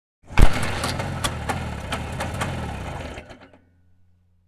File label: Motorbike ignition fail; vehicle